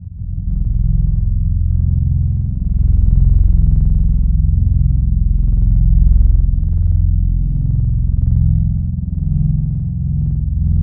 Lofi Strings High; Synthetic's Serum Collection; Surge Sounds - ASTRO - Serum Wavetables

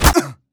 pain, player; Team Fortress 2

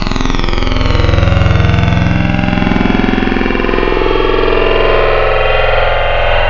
Vocal Formant Bot, Wavetables; Synthetic's Serum Collection; ESW Core Wavetables; Echo Sound Works Core Tables

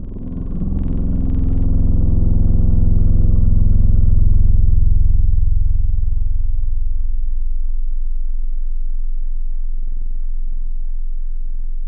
String Stac; Synthetic's Serum Collection; Echo Sound Works Sphere

